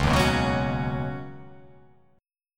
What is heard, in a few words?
C sharp 7th Suspended 2nd Suspended 4th C sharp down